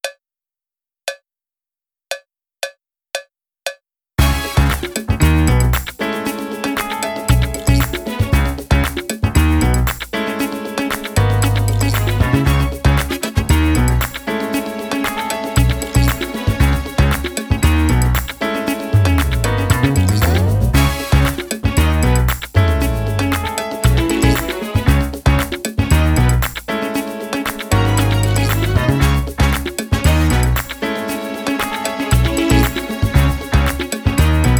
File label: Just Dont Stop drumless